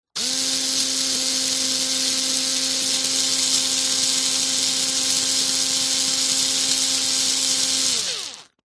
industrial electric cordless screw driver or drill long burst; zapsplat